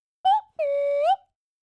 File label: cheerful; pollen robotics; reachy mini emotions library